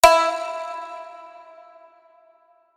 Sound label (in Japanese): 三味線効果音